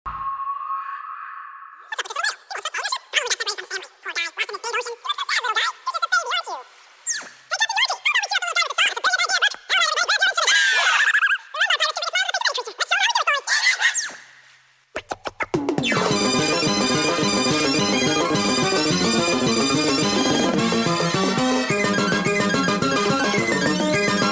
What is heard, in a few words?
Casio